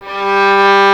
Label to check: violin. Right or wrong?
Right